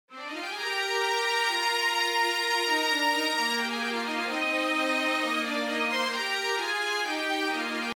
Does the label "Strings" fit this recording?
Yes